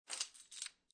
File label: Keys Pick Up Off Wood Table, Foley, Keys Picked Up Off Of Wooden Table